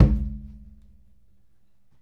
Conga Low; Essential Percussion

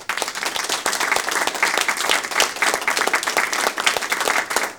SMALL CLAP; AKAI S6000 CD-ROM - Ultimate

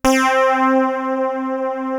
PULSAT

